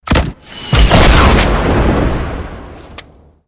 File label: gunshot